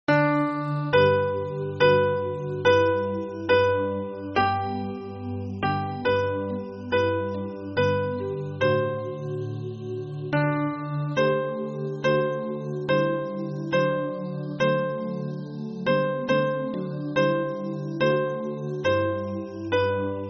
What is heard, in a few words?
hymn, soprano